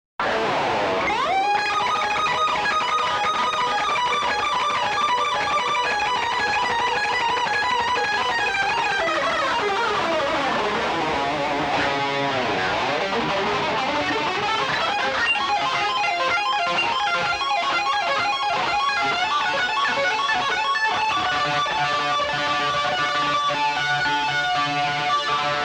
Metal Shredding

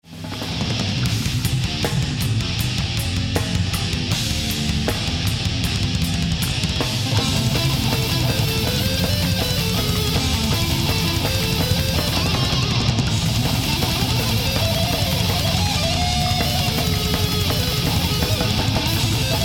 solo

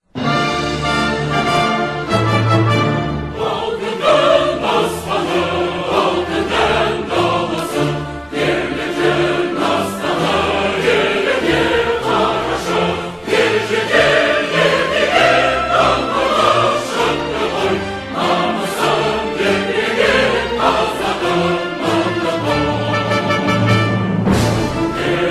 National Anthem; Khazakstan

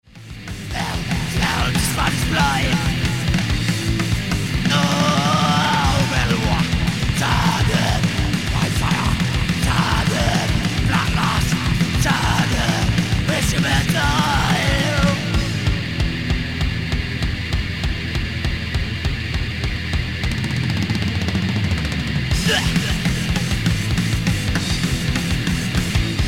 Witching Metal

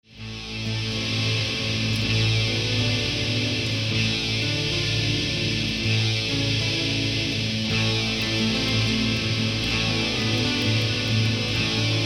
acoustic guitar

